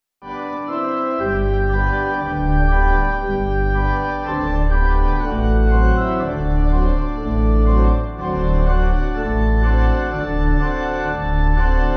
We Are Heirs, Pipe

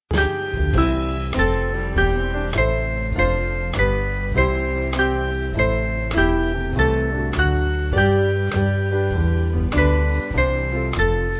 Band, Music Backing Files for Churches

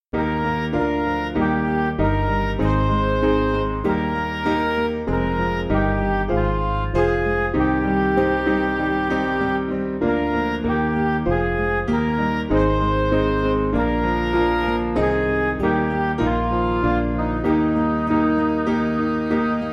Father See Thy Children, Midi; Adoro Te 2

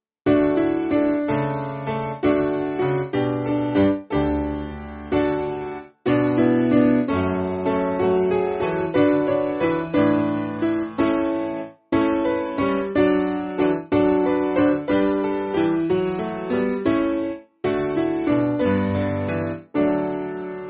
Piano, church music; Joseph Your Love; Healing

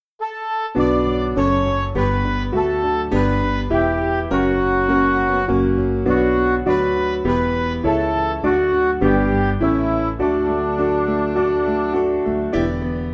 O Trinity Most Blessed, Midi; Dreifaltigkeit

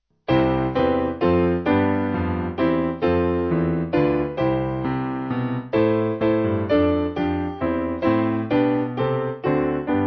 SPiano; The Strife Is O'er; Vulpius

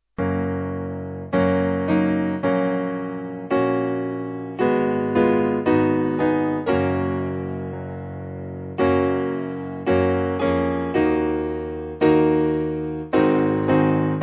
Piano

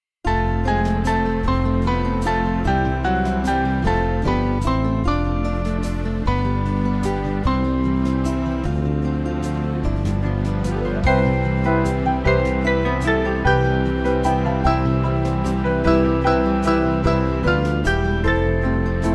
Wide Wide As The Ocean, Band

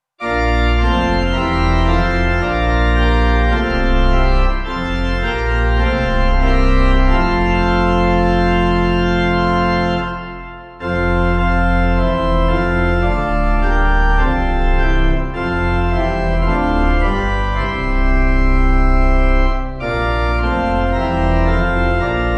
God Who Touchest Pipe

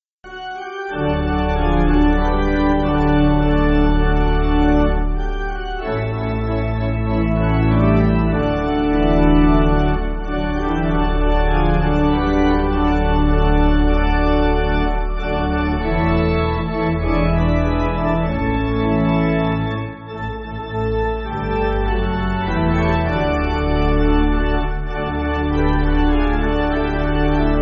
Pipe, church music

